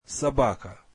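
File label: Sax Solo, духовые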